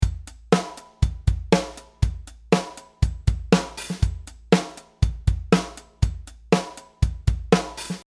one ghost, ghost notes

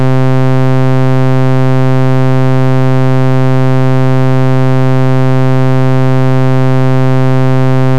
Raw saw

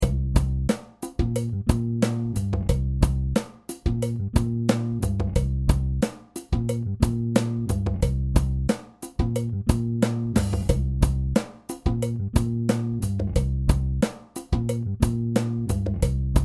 100% quantise

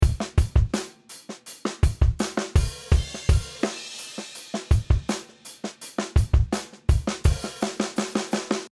EQ with all kit; enhance acoustic

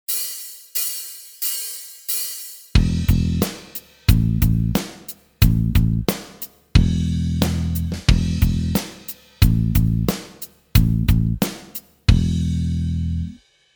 powerchords; total guitar; play guitar now

